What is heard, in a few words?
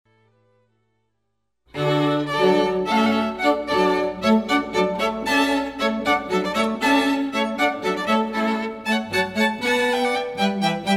hornpipe